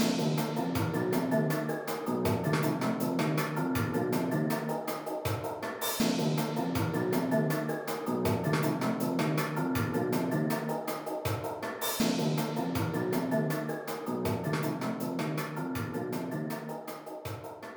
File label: BGM Mission Stage Leak; Alterna C05